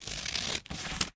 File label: Cutting Paper with Scissors; Office Supplies; 1001 Sound Effects